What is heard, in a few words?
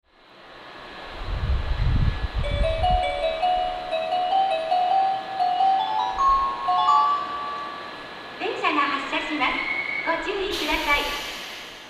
melody; 3番線発車メロディ; seibu; nerima